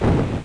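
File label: boss explosion; SF-FINAL(E)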